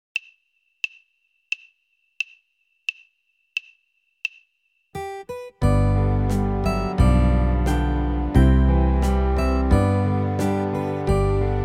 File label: There is a Fountain, Guitar